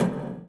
log, Misc Percussion Ticks

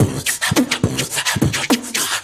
easy now, Beatbox; Drum loops

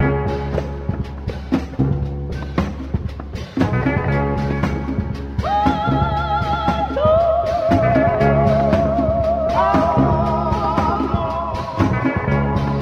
guitar and voice, Loops, Phonky

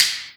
Clap n Snap, Snares

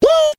woo

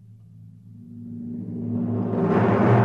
glubbering, Misc Percussion Tympany

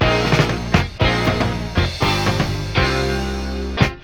horns, phonky loops

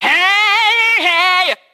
"hey hey" SUNG, voice; ooh ahh

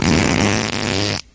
fart loud; Farts

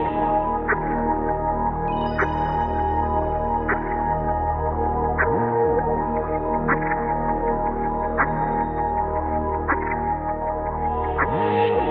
keys, Loops, Sad

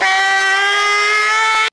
aahhehhhihhh; Voice; ooh ahh

